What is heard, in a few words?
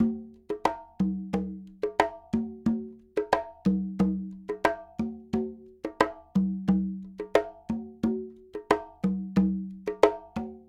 Conga, Latin Percussion